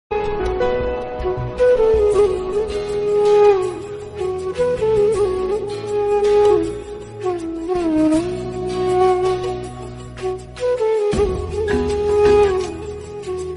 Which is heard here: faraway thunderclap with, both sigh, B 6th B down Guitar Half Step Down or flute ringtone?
flute ringtone